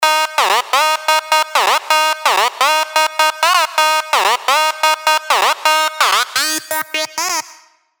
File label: Sylenth1 dirty dutch leads; Certified Dutch; revealed sylenth1 dirty dutch leads vol 1